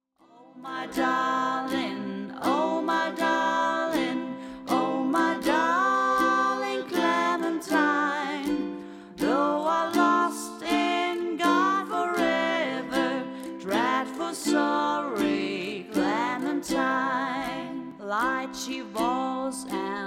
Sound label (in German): oh my darling clementine ukulele gesang